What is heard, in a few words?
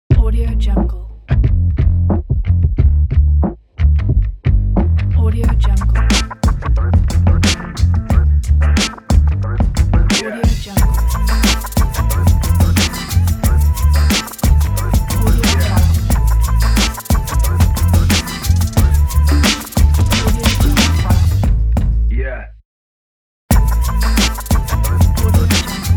classic hip hop groove intro logo